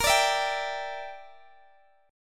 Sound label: A sharp 6th Flat 5th, Guitar Open G